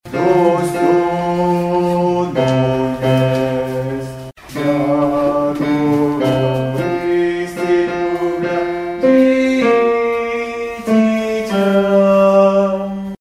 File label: dostoino iest basses; mes1-6